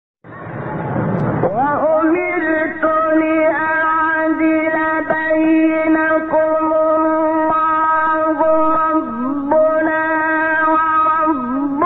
فراز زیبای آیه سوره شوری; آیه 15; استاد شحات محمد انور

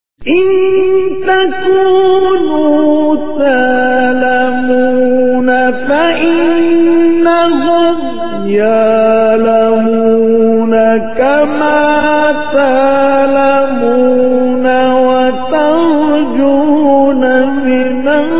فراز زیبای تلاوت آیه سوره نسا; مصطفی اسماعیل

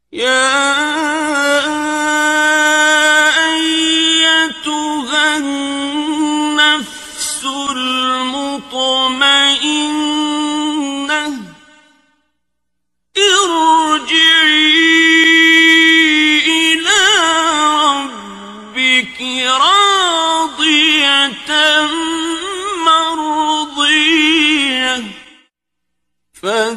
تلاوت فراز آخر سوره مبارکه فجر; استاد عبدالباسط

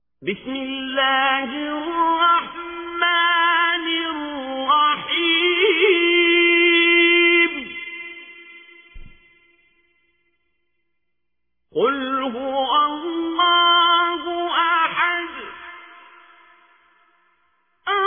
قرائت مجود قرآن کریم; عبدالباسط; سوره اخلاص

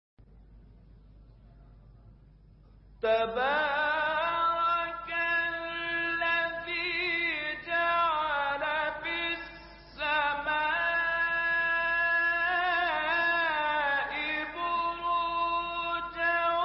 تلاوت آیه سوره فرقان; آیه 61; استاد بسیونی

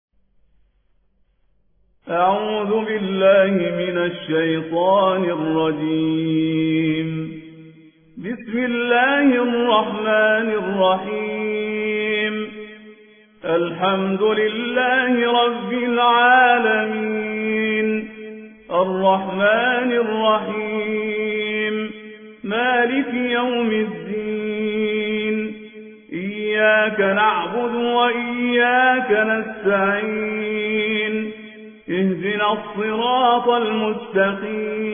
ترتیل; الحسینی العزازی; سوره حمد

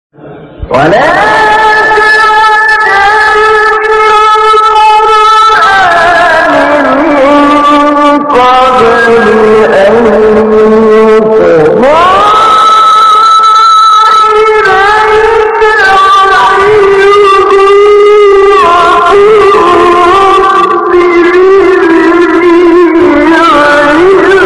تلاوت آیه سوره طه; آیه 114; استاد محمود علی البنا

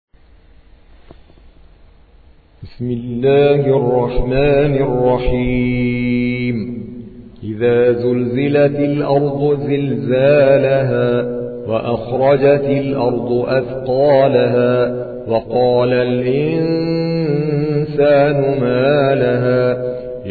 ترتیل قاری هندی; سوره زلزال

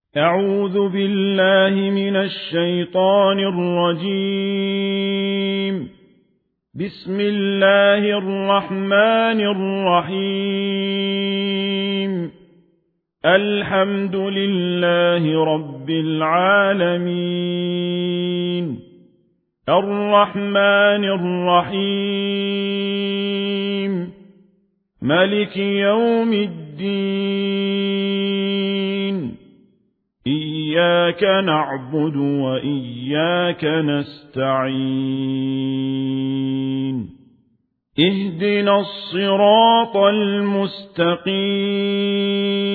ترتیل ورش عن نافع; ابراهیم الدوسری; سوره فاتحه

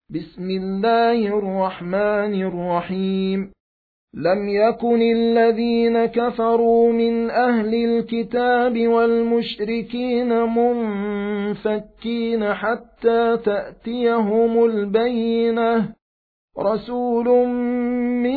ترتیل; سوره قدر

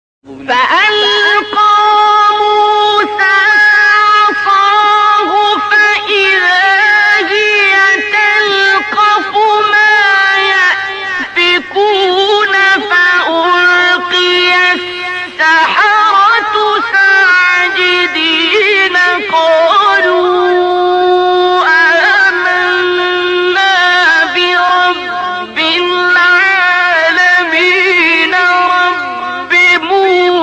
تلاوت روحبخش آیات سوره شعرا; استاد عبدالباسط

